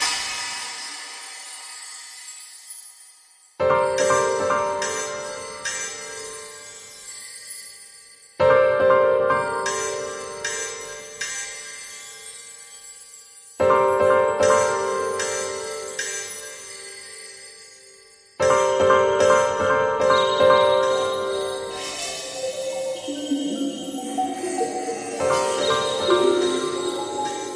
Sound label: Drone